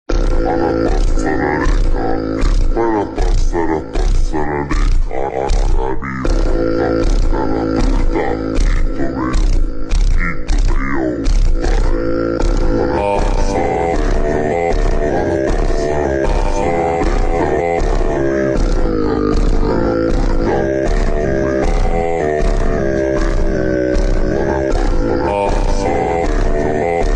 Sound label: Vocal Slide